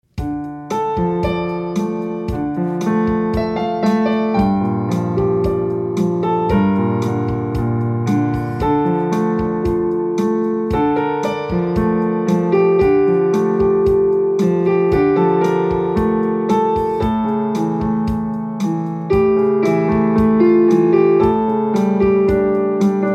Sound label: Quiet Morning, piano; Piano Compositions Arranged for Keyboard